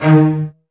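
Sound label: CELLOS F3, Strings; ILIO; Synclavier Strings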